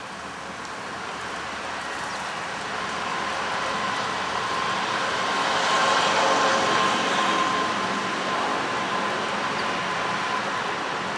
House Sparrows Parking

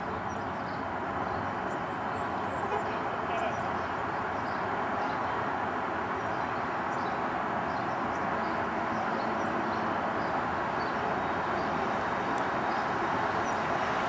Purple Finch

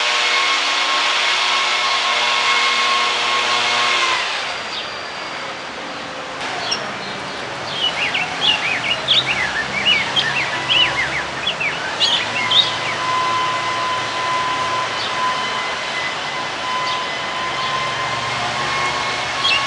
Rose-breasted Grosbeak

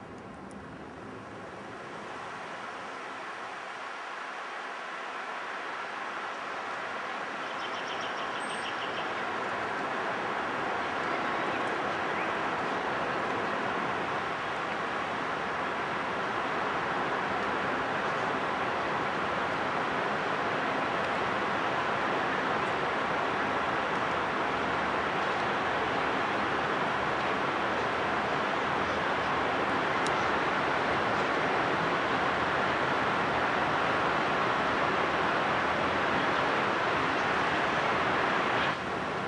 Brown Thrasher

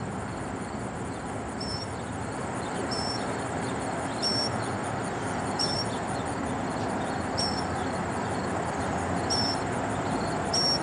mock & gold

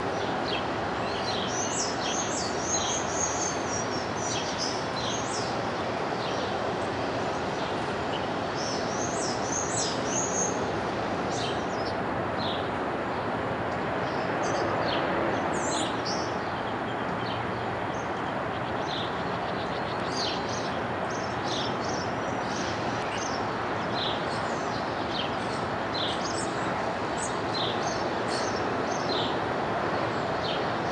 Eastern Towhees; Eastern Towhees BSB

